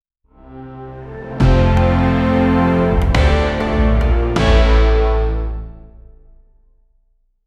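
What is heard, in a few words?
korean king entrance musi, cultural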